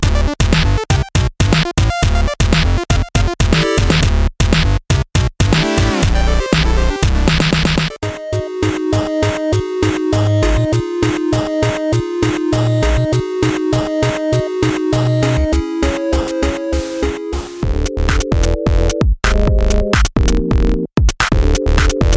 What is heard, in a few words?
8 Bit Family; DISCOVERY SOUND